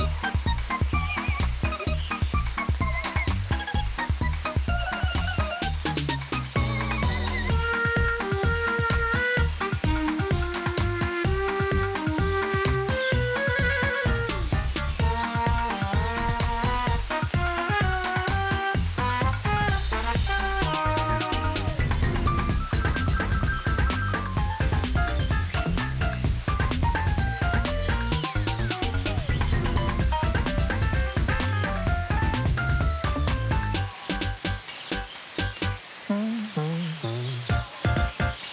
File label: Sprit Forest Rain